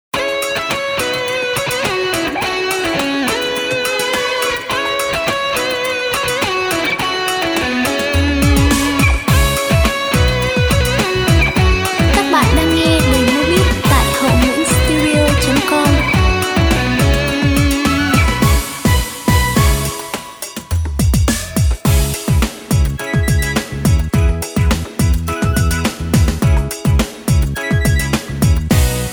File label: beat, playback